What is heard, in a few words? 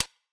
reel Stop; dead or alive 2